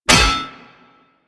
Cargo Train Exit Bolt Unlock A; Warframe; Corpus Railjack

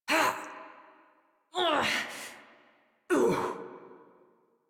Struggle Long; New War; Adult Operator

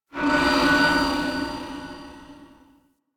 Corpus Ship Metal One Off; Ambience; Warframe; Corpus Ship Remaster

